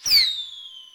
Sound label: fireworks